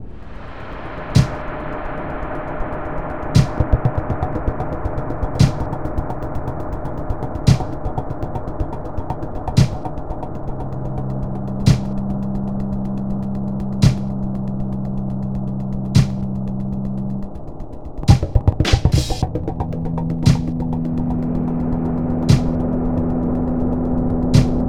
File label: Slow Jam